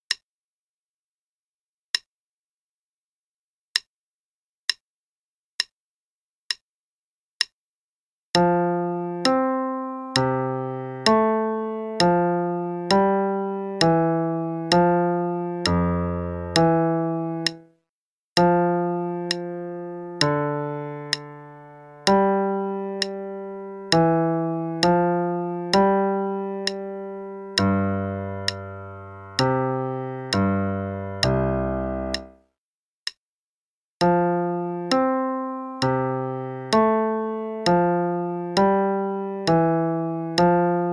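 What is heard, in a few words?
Play-a-long, Air, left hand only, quarter note=66; Bach; BWV Anh 131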